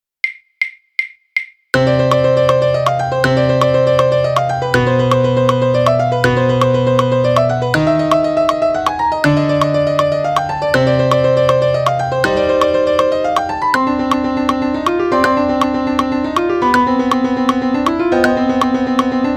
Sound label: piano click